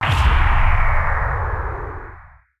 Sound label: Large Sustained Beam